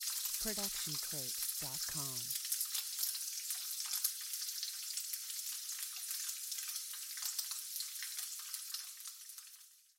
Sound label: Sizzling; SoundsCrate